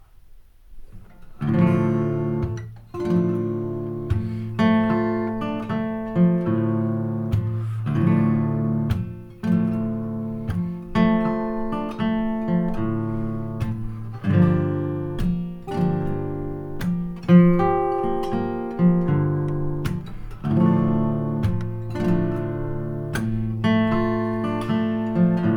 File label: 12 Bar Jamtrack D-moll